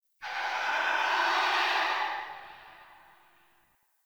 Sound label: Tree Distant Critters Angry; Warframe; Duviri; Ambience